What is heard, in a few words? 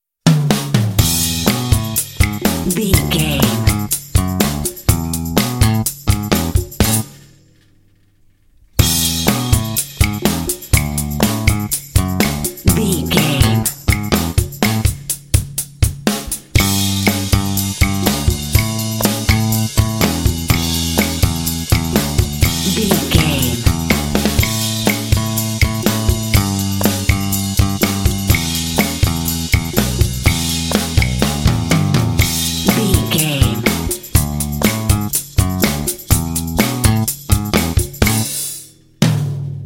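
indie pop jams drum and bass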